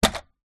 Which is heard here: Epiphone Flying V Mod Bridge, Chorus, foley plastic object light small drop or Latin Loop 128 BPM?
foley plastic object light small drop